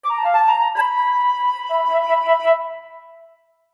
flute; Undead Overlord

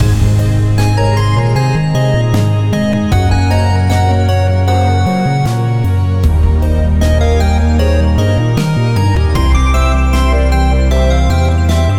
Relax Loop; Circuit Dude

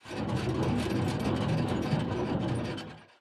waterways tank spin; Hollow Knight

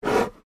Swan Giant Breaths

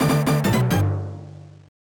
Victory!; kids of karendow

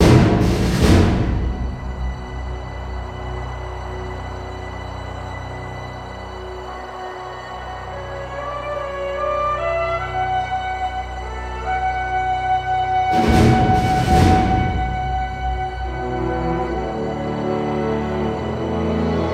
With Wind SFX; Ace Combat X2 Joint Assault; PSP; Steel Axe

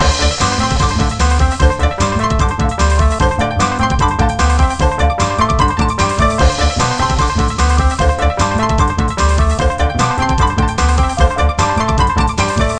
Track Reward; Scribble Hero

